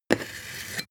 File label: sword scabbard handle short